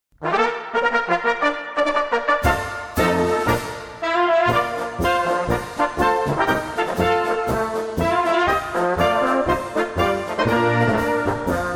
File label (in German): Marsch